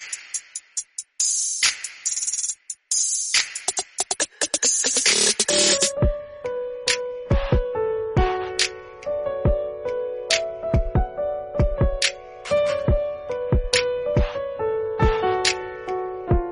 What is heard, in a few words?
instrumental Ringtones